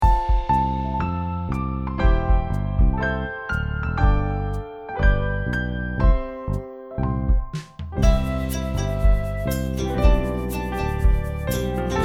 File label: karaoke